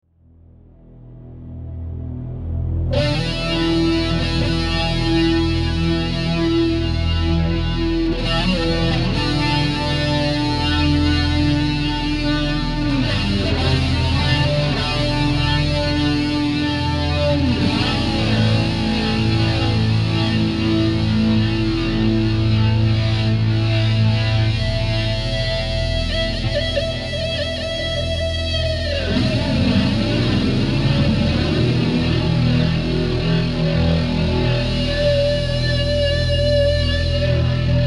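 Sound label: Intro Bassman EMG, guitar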